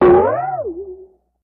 Ding Metallic Hit, chimes & bells

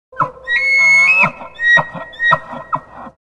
Wapiti Elk Call Bray S

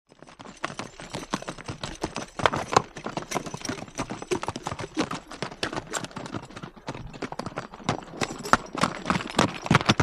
By 4 Horses Up Past At

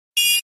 Alert Beep Sci Fi

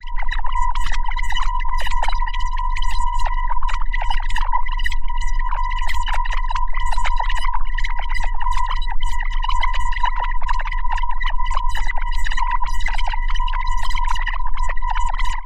forcefield

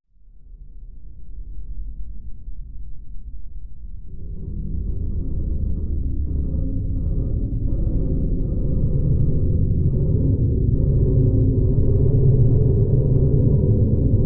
Underwater Int Metal, metal groan atmospheres; Underwater Int Metal Rumble

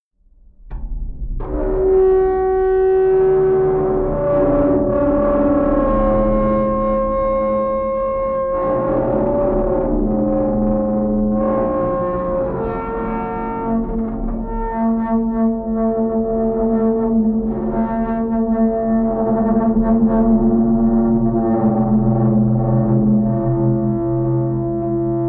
Underwater Metal Str, metal groan atmospheres; Underwater Metal Stress Ver 2

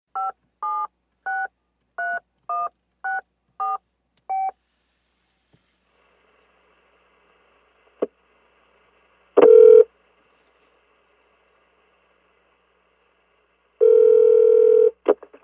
Cell Phone Inline Dial, touch tone dial; futz; touch-tone dialing & ringing; car & cell phones

